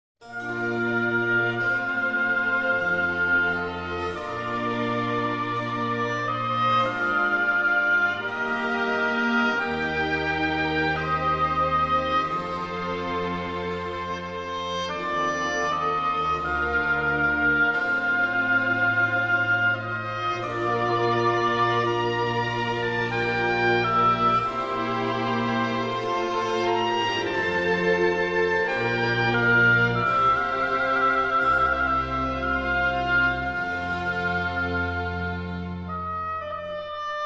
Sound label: Oboe Concerto in G Minor, Sarabande Largo; Oboe Concerto No. 3 in G Minor, HWV 287